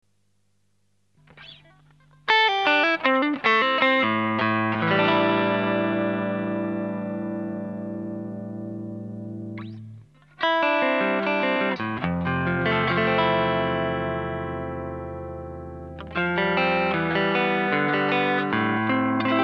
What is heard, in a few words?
electro-harmonix, CLEAN COMPRESSION; Black Finger; COMPRESS6 POST-GAIN8; PRE-GAIN4 LED/SQSH; 光源をLED、アタックタイムをSQSHにして、PRE-GAINを上げるとかなり / エグいコンプレッションが得られる。